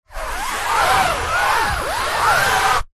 household canvas frame picture large fingernails rub scrape weird tone; zapsplat